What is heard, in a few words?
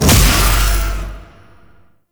Void Beam Start; Weapons; Warframe; Duviri; Incarnon Adapters